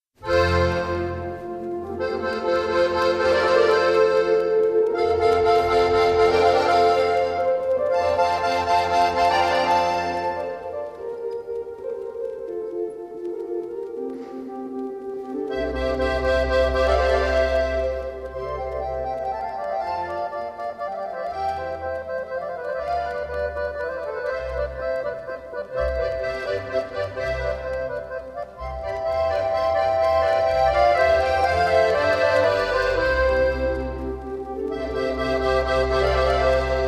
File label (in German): wassermusik